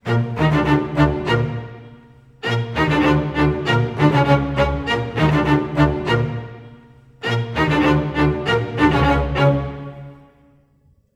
100 strings lo+mid, Orchestral arrangements, Gmin; PowerFX - Downtown Orchestra

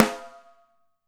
rim, Alesis DM5

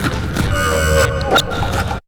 Gargle; Jungle; FX; Jungle Warfare 1&2

